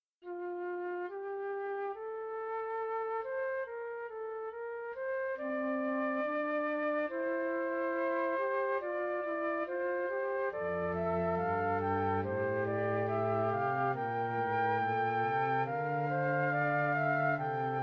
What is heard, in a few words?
short fugue